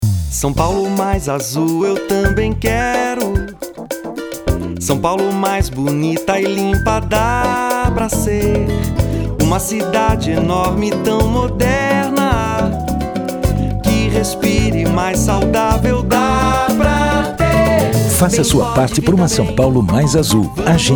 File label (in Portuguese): JINGLE